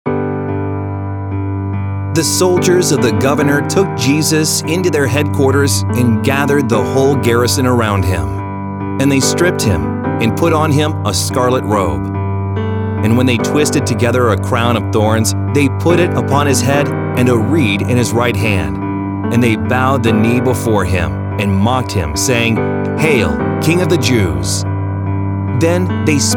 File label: Vocal